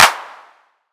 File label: normal hit clap